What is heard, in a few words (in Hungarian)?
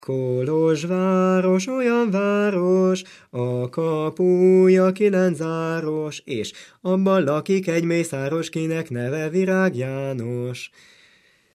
nepdal; Kolozsváros olyan város